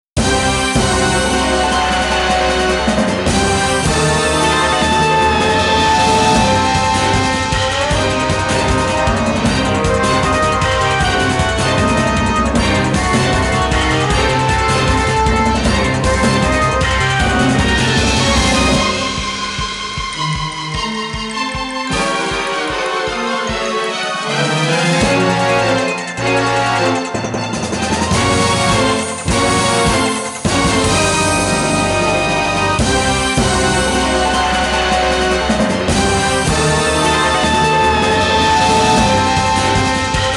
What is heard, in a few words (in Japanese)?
BGM イベント; general gear sound collection vol.1